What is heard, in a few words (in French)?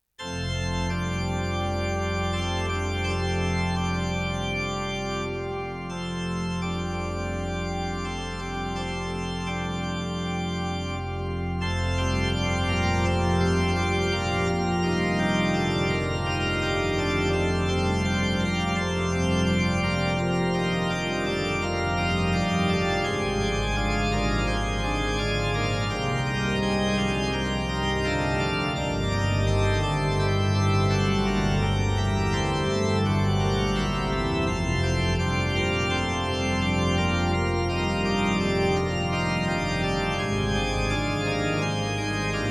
Prelude festif Volee de cloches